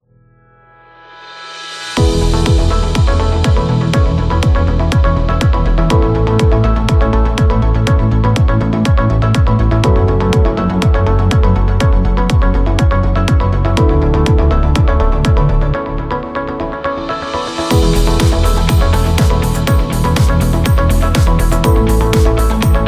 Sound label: Uplifting Corporate; office background